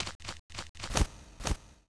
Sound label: Bat Walk